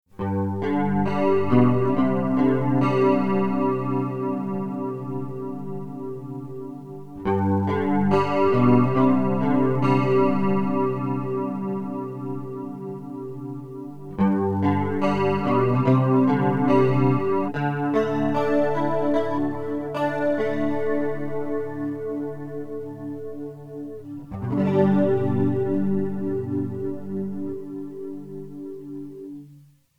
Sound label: chorus